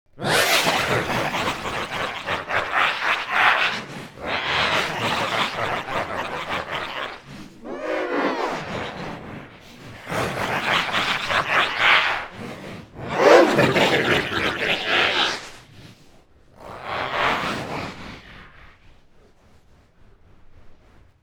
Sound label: laugh crazy timing